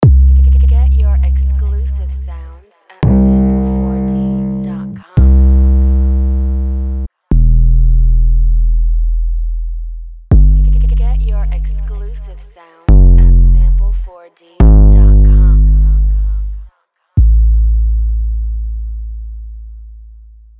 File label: Trap Bass and Kicks Loops; sample4d